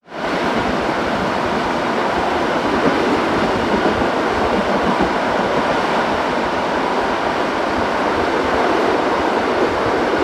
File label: Inside Fast Riding Train